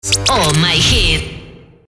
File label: JINGLE OH MY HITS SOLO; QUE RADIO